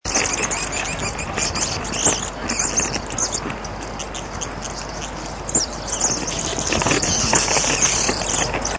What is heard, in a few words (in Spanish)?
voz misto